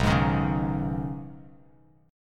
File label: B 6th Add 9th B down Bass Standard; strummed